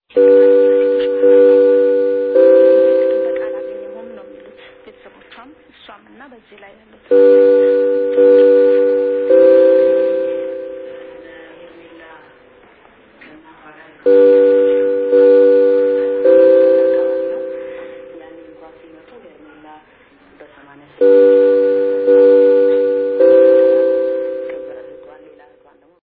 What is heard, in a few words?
bbcws interval signal